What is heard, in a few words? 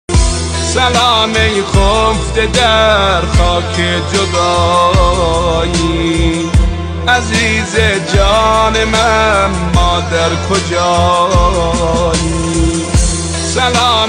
music rap ghamgin